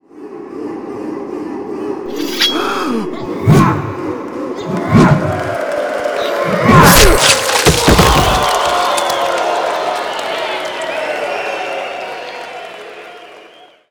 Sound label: execute